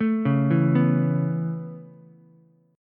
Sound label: B 7th Suspended 2nd Suspended 4th down Ukulele, chord; B7sus2sus4; arpeggiated; JGuitar